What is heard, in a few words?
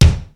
SPD-8 KIT